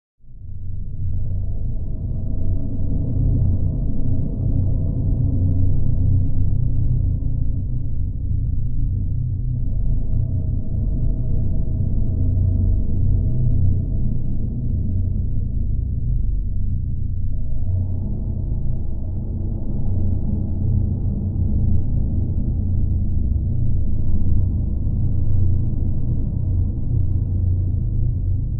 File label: Abandoned Space Ship